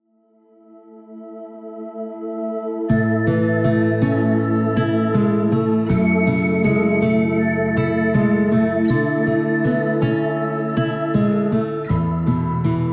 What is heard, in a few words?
guitar